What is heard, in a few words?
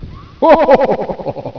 LAUGH